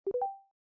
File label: Charging Started; Unihertz; Jelly Star